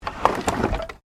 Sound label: Wood Movement Minor